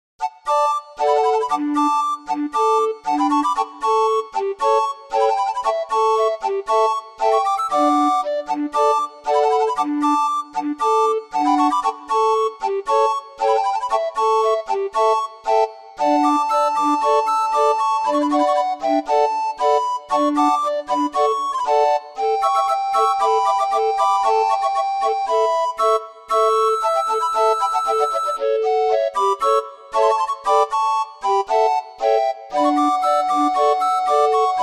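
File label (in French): La paloma, orgue de barbarie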